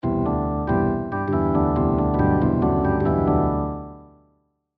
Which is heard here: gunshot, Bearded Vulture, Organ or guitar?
Organ